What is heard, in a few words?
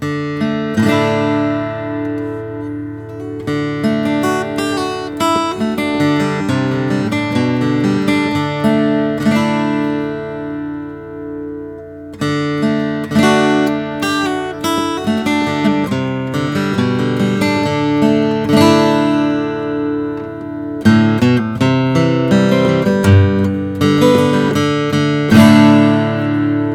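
bourgeois omc custom adirondack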